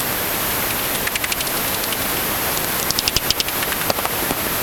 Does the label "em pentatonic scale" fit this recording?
No